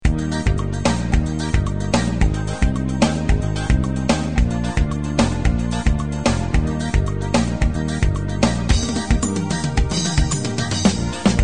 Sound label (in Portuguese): forro